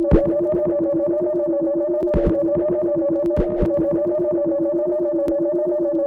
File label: Tape delay FB Loop; radiosonics